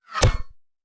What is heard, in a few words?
Bullet Impact; Sound Ideas; Guns